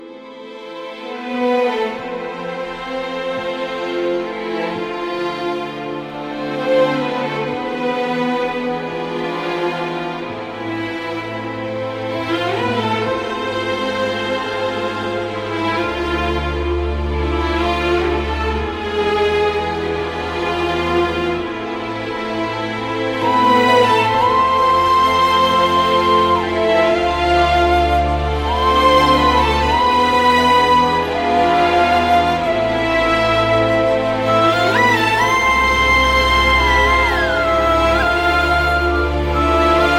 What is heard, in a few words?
Climax Sad BGM